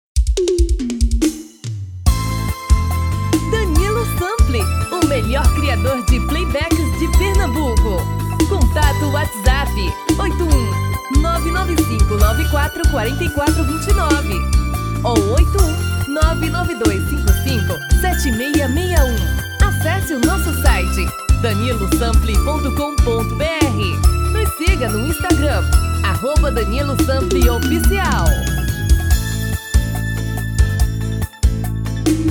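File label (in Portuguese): Brega atual dueto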